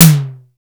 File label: sfx perc